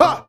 ha, Voice ooh ahh

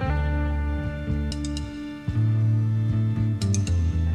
guitar, Sad

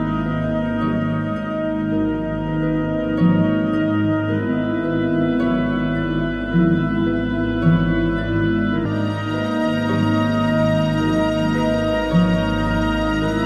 keys, Sad Loops

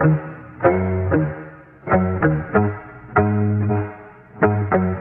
guitar complex, Phonky Loops

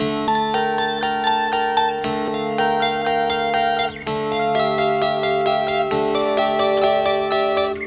guitar, Sad

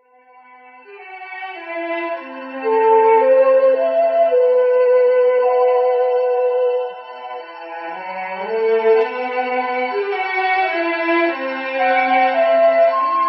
strings and flute, sad loop; loops

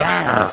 growl male; ooh ahh

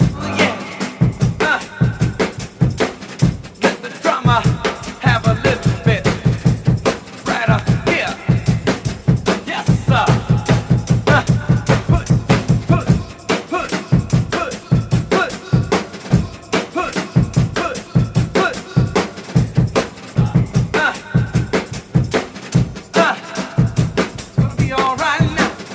all talk, Drum loops, Funky

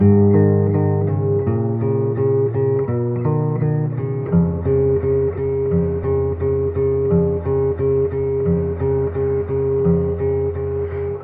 guitar, Loops, Sad

